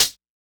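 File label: Closed Hi Hat; Interesting Drums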